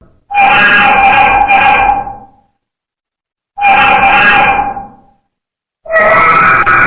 cat ahhh screaming

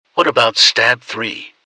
stab

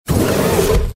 jumpscare; pj pug a pillar

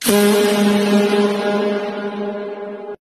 whisper fart

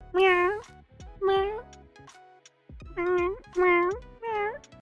meow

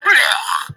blech

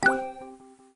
lalalun

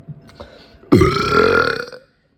burping

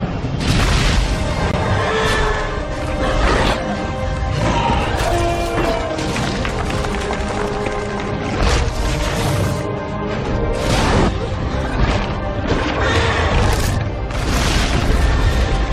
distressed t rex sounds

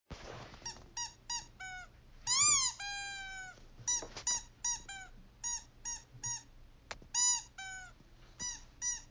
dog squeaky toy

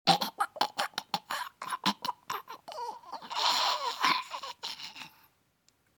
choke dying

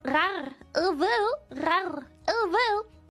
rawr uwu

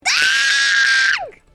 screech

